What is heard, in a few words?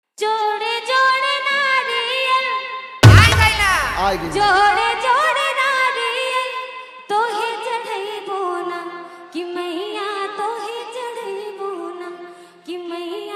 Bhakti Fully Hard Bass Mix